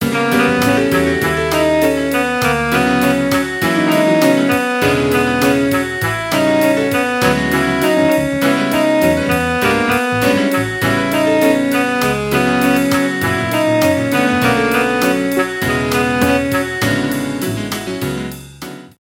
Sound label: jazz